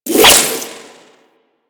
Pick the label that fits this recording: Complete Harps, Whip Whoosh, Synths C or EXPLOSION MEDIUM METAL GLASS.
Whip Whoosh